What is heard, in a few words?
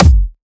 normal hitnormal; hitsounds; osu